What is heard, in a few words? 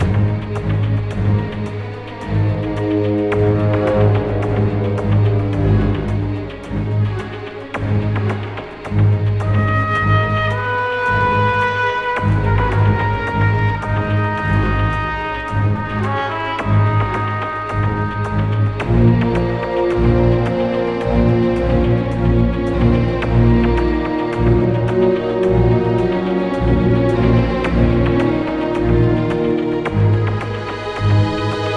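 Low Combat loop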